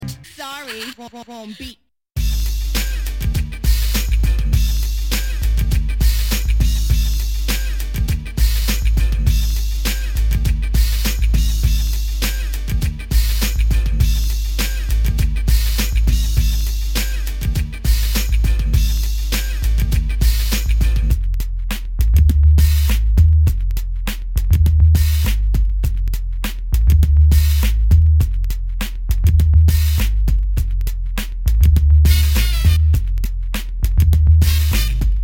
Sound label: Sorry, Wrong Beat; Cheetah Records